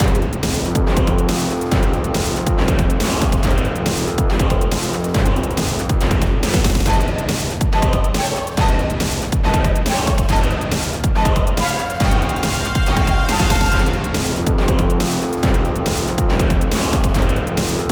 Banhammer Loop - 140 bpm; fashion police squad beta; Hackerman

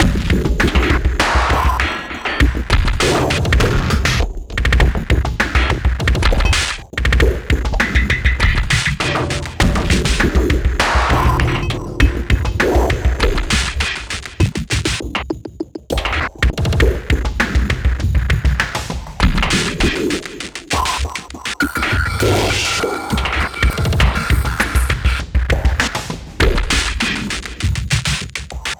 Small Fight; sky break

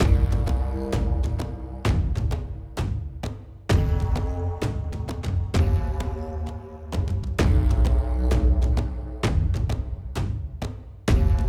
Perc Wood Med Lp; unruly heroes

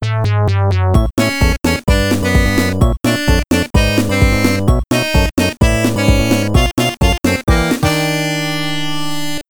Continue Screen

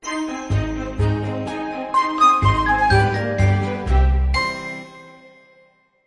Game Win

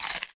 Pickup; Pinguinos Biri Game; Android

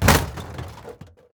plastic break

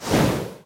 Ui Game over intro; Talking Tom Gold Run

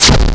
stab white; lucius demake gamerip 2016 pc linux macos

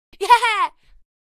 Fight end; Talking Tom Gold Run

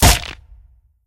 Misc Coconut Break

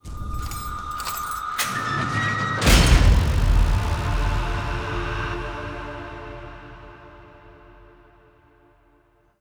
Round End (With SFX); Zombie Revelations - Verruckt

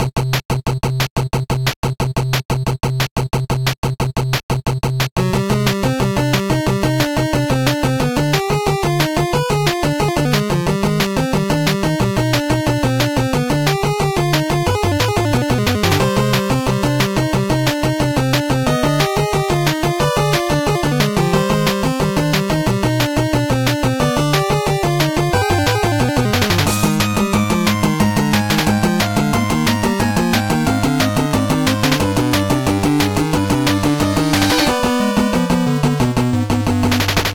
Treetops; ninja senki dx; macos ps vita ps4 windows

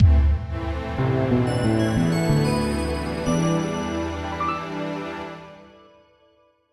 gameover; Nankou Furaku Sangokuden Shu to Toki no Doujaku; 3ds

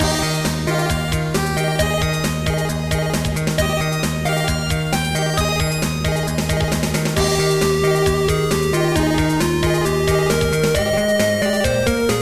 Chiptune the Stars; Voidspire Tactics

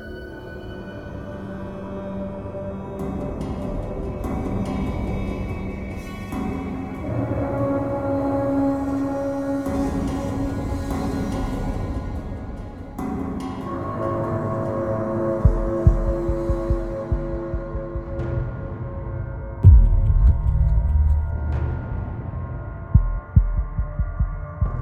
Explore Suspense

